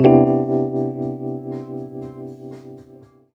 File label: HEY, Roots Disco; USB Soundscan vol.32; AKAI